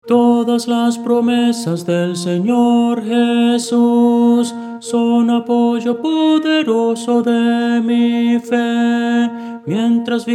tenor

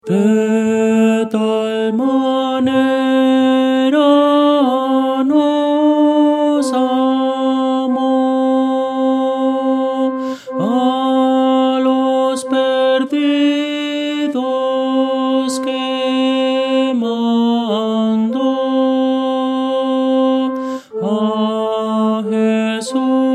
tenor

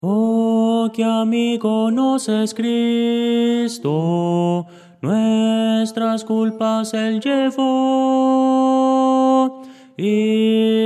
tenor; oh que amigo nos es cristo

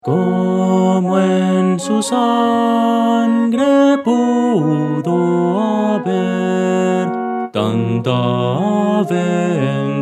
melodia, himnos cristianos

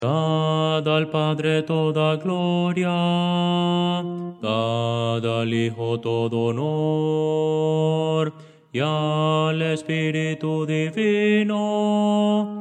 bajo; Voces para coro

